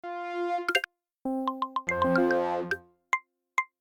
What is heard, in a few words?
Audio-Logo; Espacio Plus